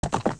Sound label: Horse Gallop; Ganondorfs Horse; Zelda; OOT